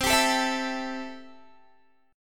C 6th, Guitar; strummed; 20,22,19,21,21,x

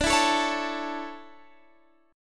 D Major 7th Suspended 2nd, Guitar Half Step Down

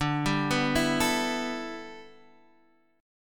E Minor 11th D

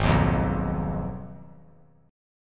Ab Minor 6th Ab, down, 3C, 3F, 3A, 3D

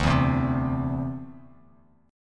D 7th Suspended 2nd down Bass Standard; D7sus2 strummed

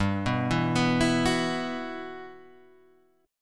B Suspended 4th G, chord; Bsus4/G arpeggiated; JGuitar

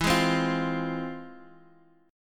F Minor Major 7th, chord

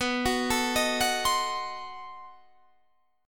B 7th Flat 9th B, guitar chord

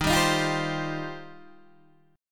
Eb 6th Add 9th, Guitar, DADGAD; 13,10,13,10,10,10

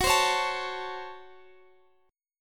Db Major 7th Suspended 4th Sharp 5th Gb

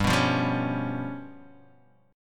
Db 7th Flat 5th G

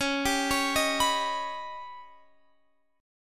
Eb Augmented 7th Db

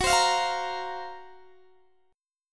Gb Major 7th Flat 5th Gb, Guitar Standard; strummed; JGuitar

